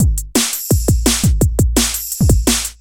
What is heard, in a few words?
Great Drum N Bass